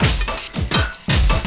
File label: LOOP, 162 BPM, Drum & Bass